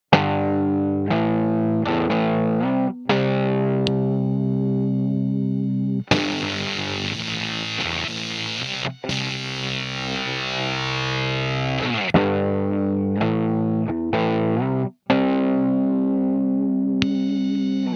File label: guitar